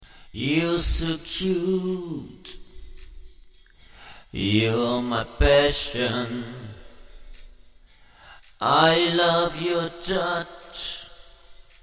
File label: indie 120 C, acapellas